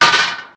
metal dug; everness

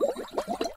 underwater bubbles; everness

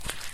mud footstep; everness

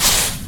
sand dug; everness

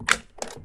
chest open; everness

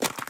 ice hit; everness

